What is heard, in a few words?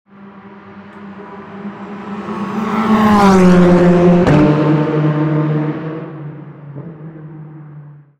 TCR Passing